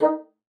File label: bassoon, D#4; Xsample VOL-6